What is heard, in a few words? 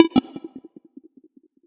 passcode fail